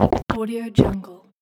a gulp

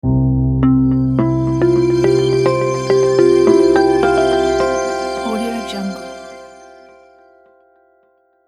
company logo

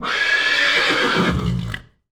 horse whinny; prosoundfx

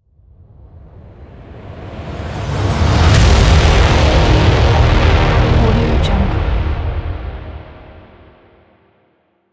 epic braaam transition

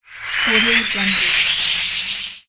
metal wheel friction

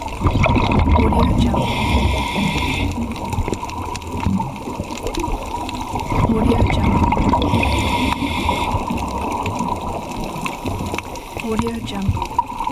scuba diving underwater swimming and breathing; kilmermedia

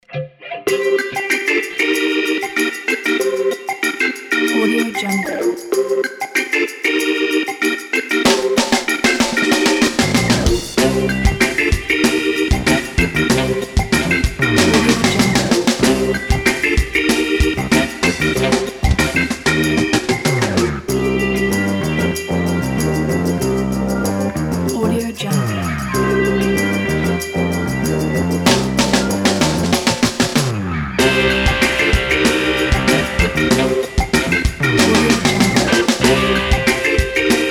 funk season pack; crispsound

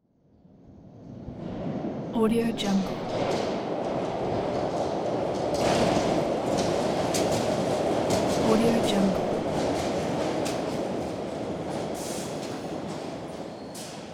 metro train

